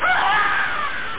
Monster Death; Yipe! III; Monsters

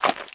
fs mud; Spearhead Demo; MacFormat 2003 June

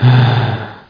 breath; quake

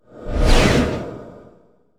Guardian Damage; FantasyTennis

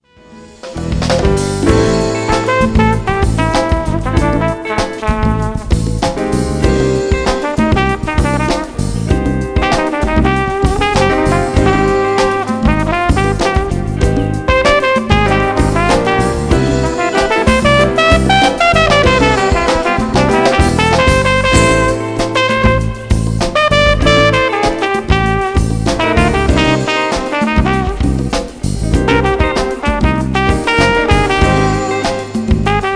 groove

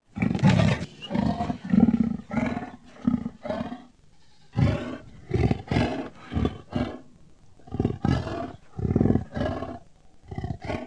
growling; Chats & Autres Felins; Encyclopedie des Chats

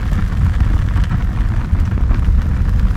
Burn Tank; 1944 Battle Of The Bulge

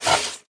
make linen

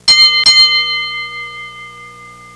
gas station bell; Sound Effects for Multimedia - Volume 1

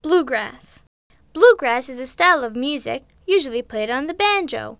Bluegrass

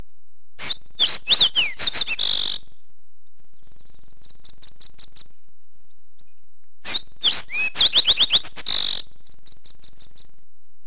SPARROW; Webster's International Encyclopedia 1998

